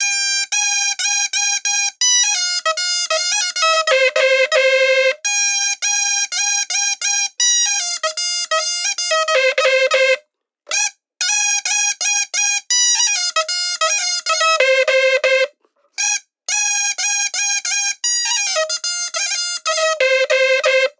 6 BPM; Gully Loops; waves of bihu vol 1

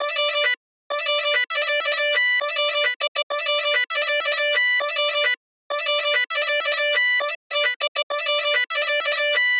Chop Loop BPM 100 B; Bihu; waves of bihu vol 2; Gully Loops

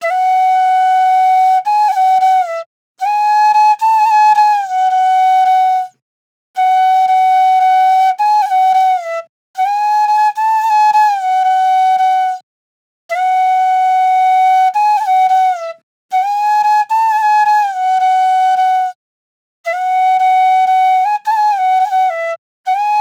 90s Flute Loop 110 BPM F

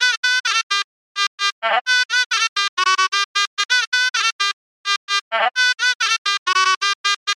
Baraat shenai loop BPM 130 B; Gully Loops

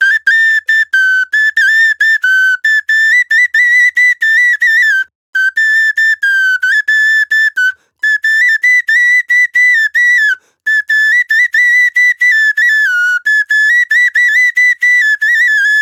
Hutuli Loop A Maj; Gully Loops; waves of bihu vol 1

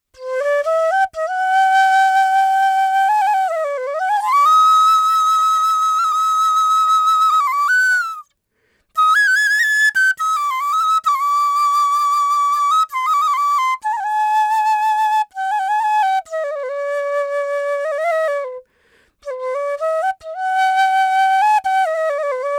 Flute Loop C Maj; Gully Loops; waves of bihu vol 1

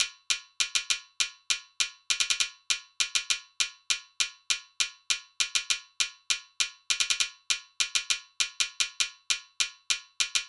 Hihat Loop BPM 100; Bihu; waves of bihu vol 2; Gully Loops

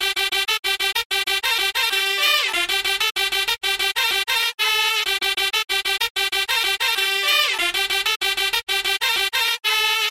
Baraat shenai loop BPM 95 G; Gully Loops

